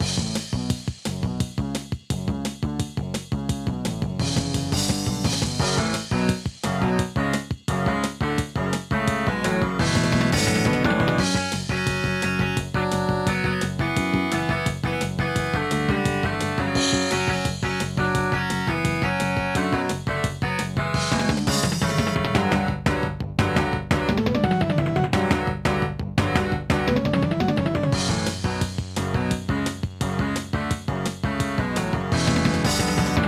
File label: Intro, Alesis Harmony 32; Duke Nukem 3D